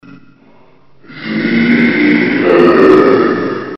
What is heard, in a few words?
zombie; dod